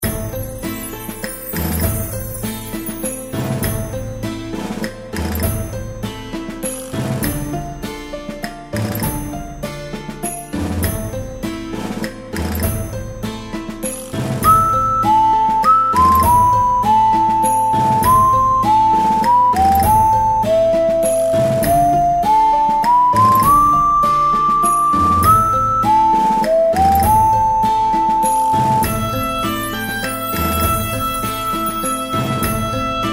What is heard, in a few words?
reelspin bg; California Gold Rush